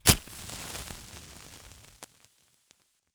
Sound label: grenade smoke russian ignition; Escape from Tarkov